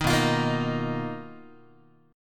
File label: B Major Sharp 11th, Guitar, 4A,4E,5A,5D,5G sharp,6C sharp; BM#11; strummed; JGuitar